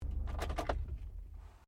CAR SUBARU LIBERTY INTERIOR SHUTDOWN

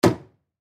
Ship Bathroom Medicine Cabinet Close Head; Transportation Watercraft; Boat; Boat Sound Effects; Blastwave

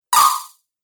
Ice Shard Spell, Magic Sound Effects

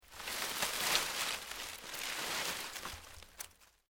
LEAVES DEAD MOVE, Rustling; Material components for constructing more complex sounds; Objects

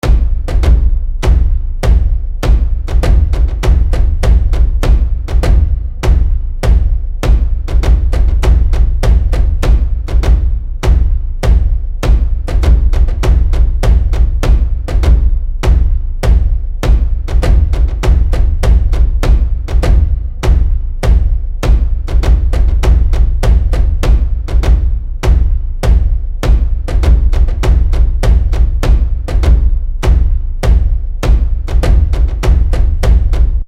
War Drums Loop; Musical Sound Effects

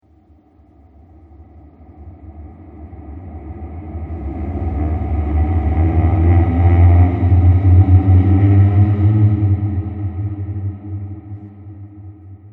Ghost Of The Monster; Ghost Sound Effects; horror

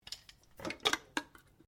Can Opener Attach Can; various metal cans; Metal (Sound Effects:Materials)

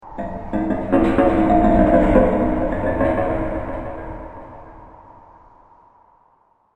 Scary Scene Wind And Metal, horror sound effect; Scary Scene Fx - Wind And Metal; Scary Soundscape Sound Effects

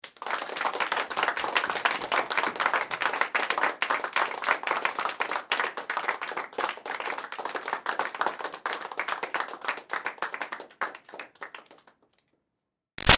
Crowd Small Applause Med; Interior; Human

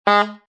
A saxophone fat honker.; Funny Musical

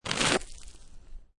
Snow And Ice Ice Scrape With Ice Fragments; Weather

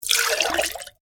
Water Poured Into Drinking Glass; Water Poured From Jug Into Drinking Glass; Pouring; Objects; McKinneySound

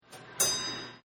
Ring The Bell, Arcade; Backgrounds; Partners In Rhyme

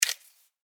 Food Pepper Grinder Twist Single; Household

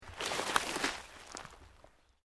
Underbrush Dead Move, Rustling

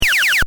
Laser: Firing Retro Style; Laser Sound Effects; Lasers (Sound Effects:Science Fiction); Retro laser loop; Stuart Duffield

